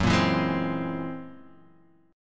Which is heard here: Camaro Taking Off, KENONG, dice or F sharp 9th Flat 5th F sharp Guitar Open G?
F sharp 9th Flat 5th F sharp Guitar Open G